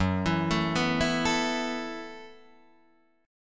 F sharp Minor 11th, Guitar, Drop D

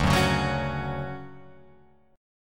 D Major 7th, Guitar, Open F; strummed; JGuitar

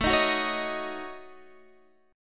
A 6th Flat 5th, Guitar, Standard; A6b5; strummed; JGuitar

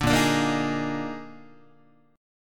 Bb-11th-Bb-down-Guitar; Bb11 strummed; JGuitar

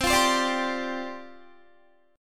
C Suspended 2nd Suspended 4th, down, Guitar; Csus2sus4 strummed

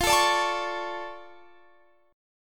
D Minor Major 7th F

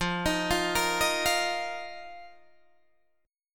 F 7th Suspended 4th F, Guitar, DADGAD

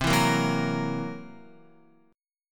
C 7th Flat 5th C down Guitar; C7b5; strummed; JGuitar

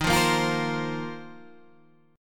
F 7th Suspended 4th D sharp

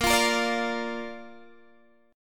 A sharp Major, down, Guitar, Half Step Down; chord

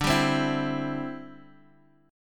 D 9th Suspended 4th D Guitar Open G

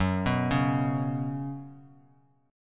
Gb Suspended 4th Gb, down; arpeggiated; JGuitar

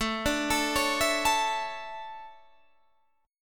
D Major 7th Suspended 2nd

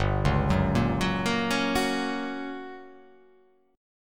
G Minor Major 7th Flat 5th G; chordshape; down

